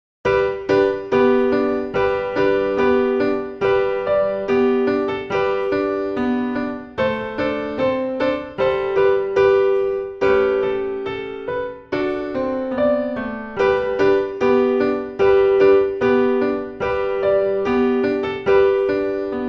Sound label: Jingle Bells